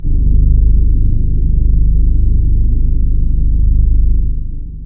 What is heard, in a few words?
bass